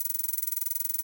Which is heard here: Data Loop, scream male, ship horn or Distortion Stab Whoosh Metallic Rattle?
Data Loop